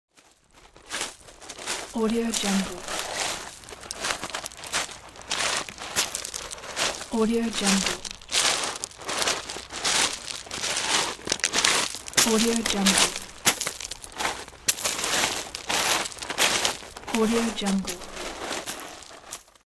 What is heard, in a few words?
beach footsteps sound with shells; almira23